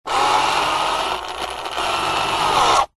science fiction robot small tracks wheels motor whirr spin